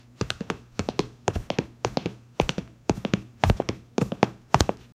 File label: funny flap flap slipper